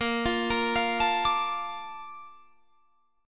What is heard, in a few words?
Bb Major 7th, Guitar, Drop D; 20,20,20,22,22,22